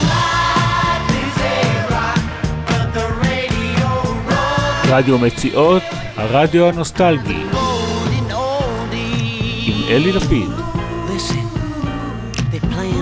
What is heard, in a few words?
Jingle